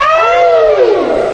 ow crowd; ooh ahh; ow ouch

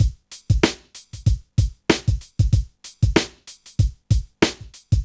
Drum loops, Boombap

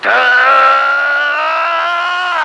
scream

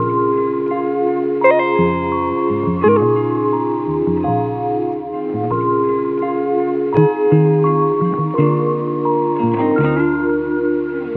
keys and guitar, loops, phonky

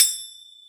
spit, Triangle; Misc Percussion

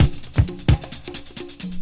rubber duckie, Drum loops

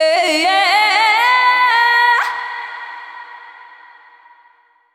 "ah yeah" SUNG

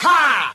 "ha", Voice

